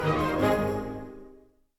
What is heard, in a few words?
Music Dialogue Joyful End 60bpm